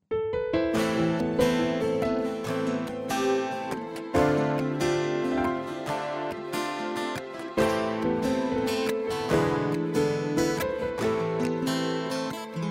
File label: Song, FR